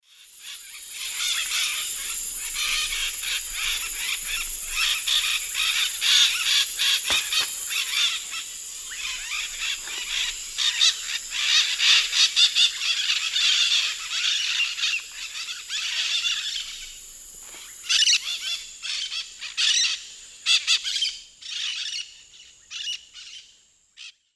Aratinga leucophthalma, flight calls; S. America; Eastern Lowlands

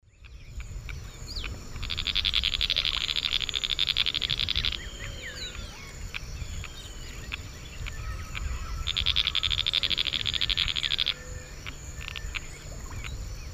Synallaxis propinqua, call; S. America; Eastern Lowlands

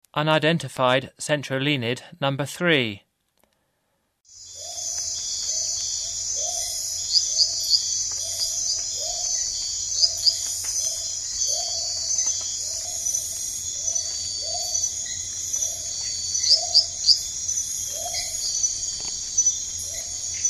centrolenid, Frogs of Ecuadorian Amazon; S. America

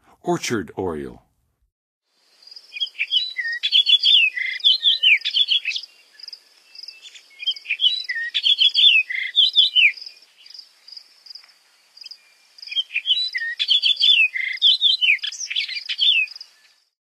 Orchard Oriole, Songs of Birds of North America; Western USA; Western USA Songbirds

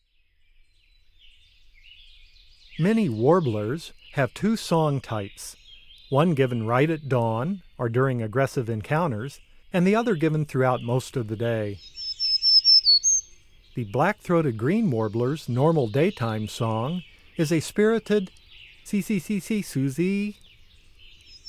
Black-throated Green Warbler; North America; Common Western Species; Know Your Bird Sounds

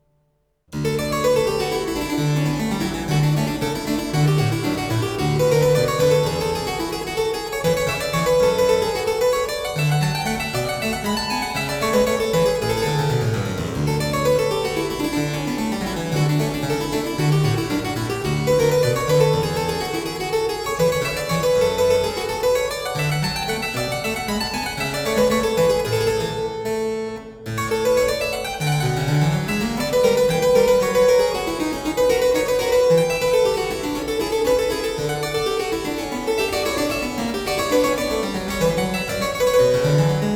French Suite No. 4 in E flat major; Bach; BWV 815; VI. Menuet; The French Suites

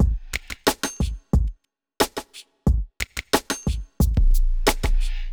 bell ringer 90

